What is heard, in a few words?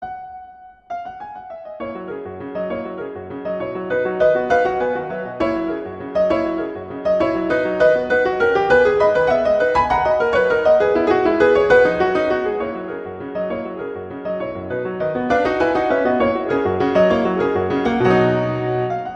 B major, Vivace; Chopin; Preludes